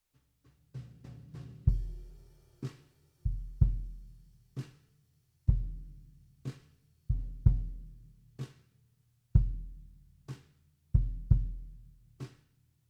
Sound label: BD Out